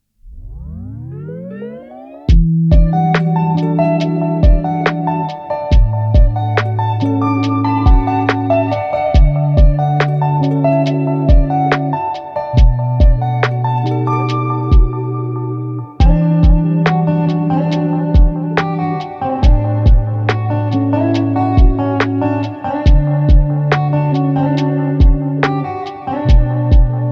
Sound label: Lofi